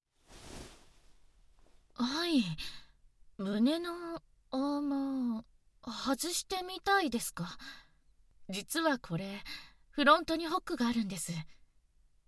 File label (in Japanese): ねっとりお掃除バキュームフェラ